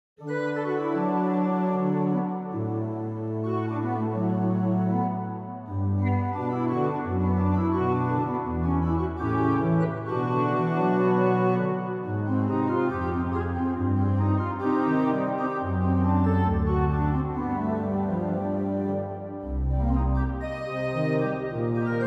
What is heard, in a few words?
Sketch, Ducks' "vocalizations" with Organteq; Summer; Pianoteq; Modartt